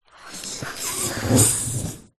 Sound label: foley balloon blow up inflate short